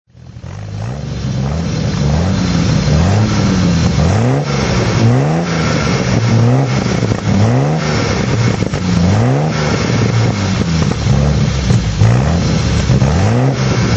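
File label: tr6 su throttle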